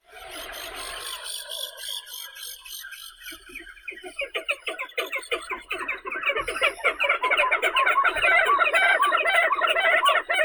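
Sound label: crested auklet, Aethia cristatella; konuga bolshaya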